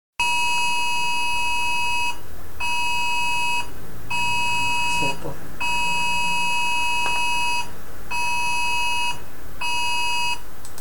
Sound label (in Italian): suono alert; it-alert